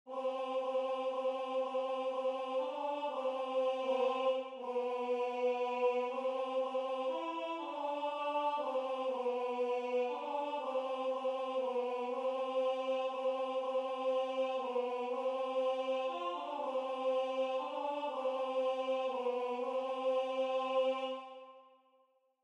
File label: Alto